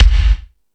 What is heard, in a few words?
Discoball, Drum Machines E-MU Pro-Cussion